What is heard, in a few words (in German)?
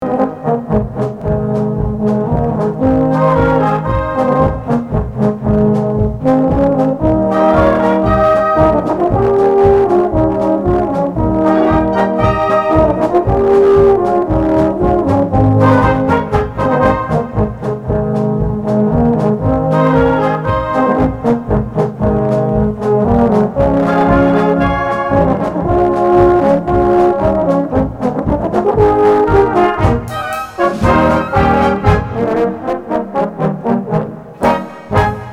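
Polka, Blasmusik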